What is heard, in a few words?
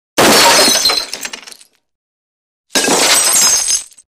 glass breaking